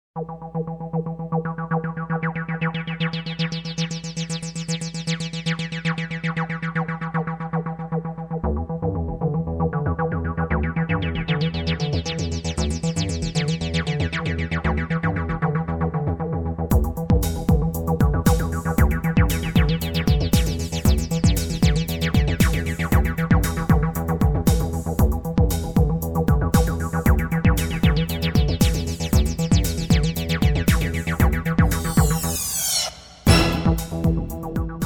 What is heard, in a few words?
techno highest